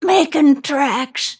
move pain; snapfire

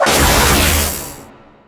lightning strike; razor

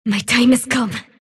sylph move pain; dota; dark willow

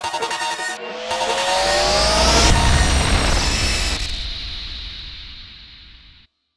taunt; dota; sand king

